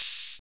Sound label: clear open hat one shot a sharp key